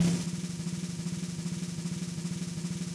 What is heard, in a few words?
Snare Roll; Yamaha DTXpress-II